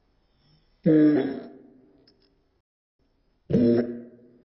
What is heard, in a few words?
Dilophosaurus, Dinosaur Roars